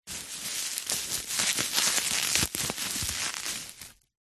plastic packaging film bubble wrap handling movements; office